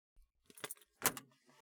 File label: locker; 鍵を回す音